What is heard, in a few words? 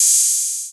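808 open hi hat; Wizoo - Platinum 24 Electronic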